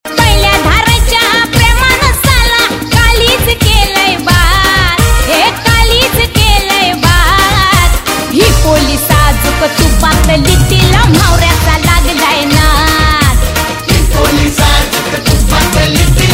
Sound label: Marathi Ringtone